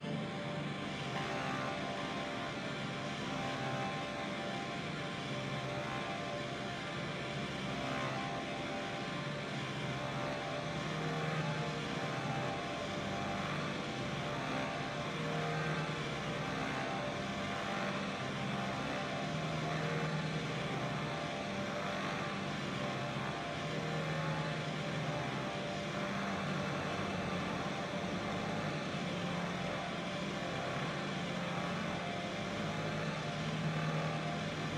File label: theme noise